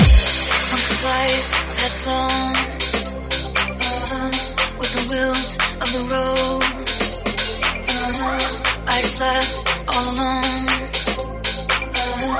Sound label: 118 bpm C